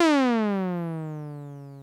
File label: Bleep, Korg DS-10